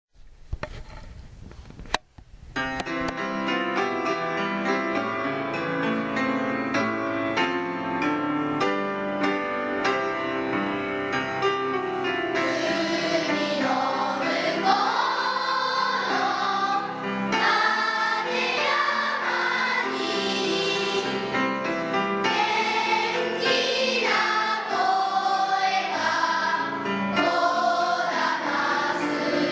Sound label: school music; nadaura